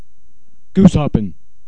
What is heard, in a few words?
GOOSEHOP